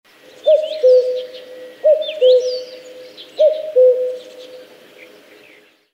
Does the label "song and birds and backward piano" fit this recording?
No